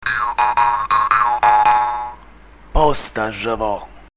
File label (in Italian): Messaggio